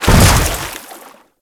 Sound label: Grineer Land Water, Footsteps; Enemies; Warframe